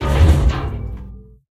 damage hits explosions; World Of Warships

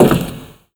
foot tin roof, footsteps; Call of Duty Modern Warfare

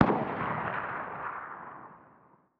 sawnoff shotgun attack far distant; Rust

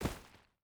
footstep barefoot stones jump land; Rust

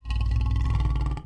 Panther Heal Vox; Civ4 warlords

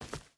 footstep hide forest walk; Rust

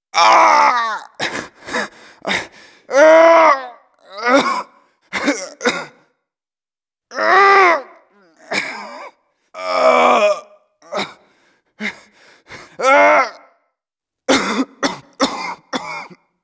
airport civ death voice; Modern Warfare

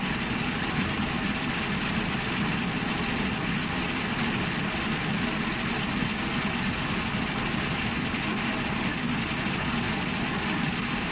panzer6 engine rpm, engines tanks; War Thunder

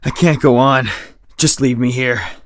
tired; Escape from Tarkov

